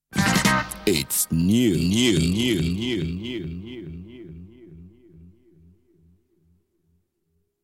Jingle

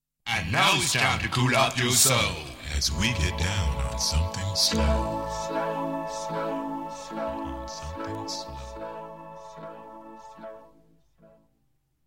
Jingle

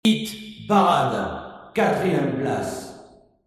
Jingle

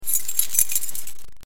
Keys

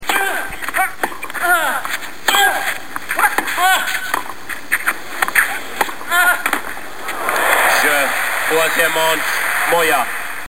Tennis

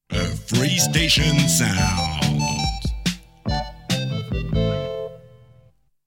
Jingle

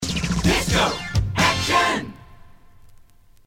Jingle

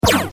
Laser

